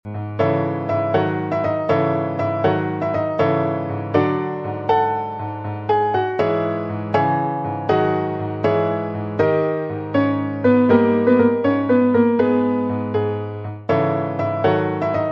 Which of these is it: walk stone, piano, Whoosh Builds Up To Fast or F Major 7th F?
piano